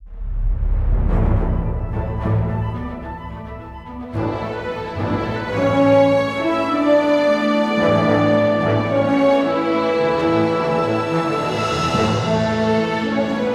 Hatchery Music; Jurassic World Evolution 2; PS4; Windows; Xbox One; Xbox Series X/S

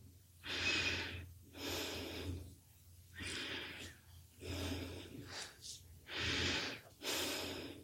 Breathing; lethal company; ambience; windows

